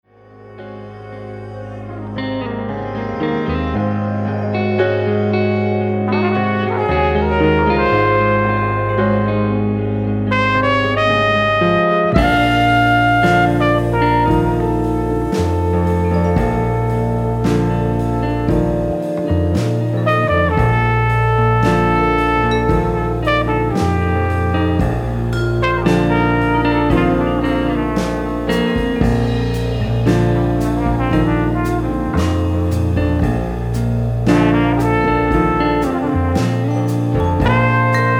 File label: Quintet Flamenco